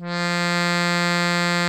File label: ACC F2; InVision Interactive; Lightware VOL-1; Instruments & Percussions; ACCORDION 1